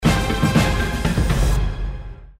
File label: token grab; player; GES; gesource; xshemps customs